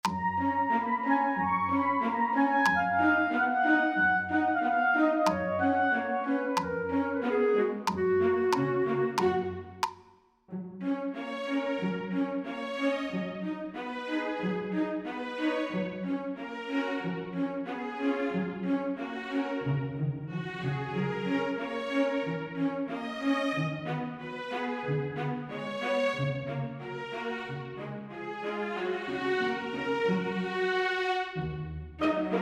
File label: Classical; Motzart; keyboard and piano resources